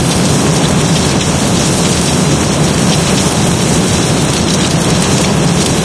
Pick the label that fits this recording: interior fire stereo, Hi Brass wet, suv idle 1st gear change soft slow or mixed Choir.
interior fire stereo